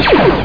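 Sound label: blaster; Doom2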